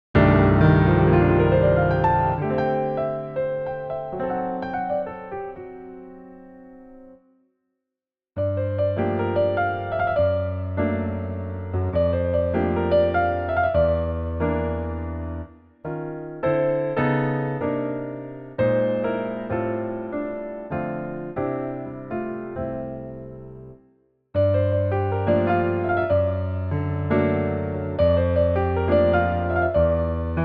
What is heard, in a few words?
Piano Ver.; Sora no Kiseki the 1st